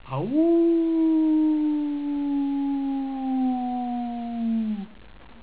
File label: wolf